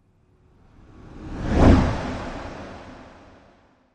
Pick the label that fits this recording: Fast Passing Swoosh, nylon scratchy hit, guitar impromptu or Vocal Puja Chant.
Fast Passing Swoosh